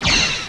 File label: Phaser